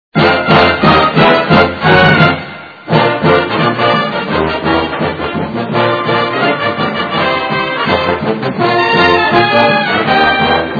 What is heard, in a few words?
Fight Song; Marshall Thundering Herd; NCAAF Football